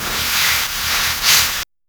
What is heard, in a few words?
women hair dragging on fl